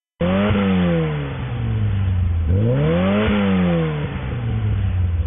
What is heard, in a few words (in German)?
maniflow zwei rohr mittelrohranlage